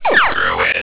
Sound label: phasers; VitalWatch; druid